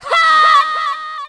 女 怒吼

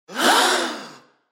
惊讶 一堆人

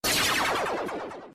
魔法变身音效

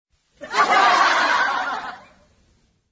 观众笑声

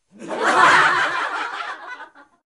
观众笑声

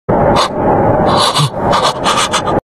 哽咽难过

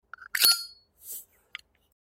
打火机-打火

